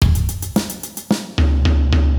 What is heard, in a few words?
break